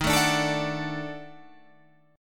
D sharp Minor Major 7th Flat 5th D sharp Guitar Drop D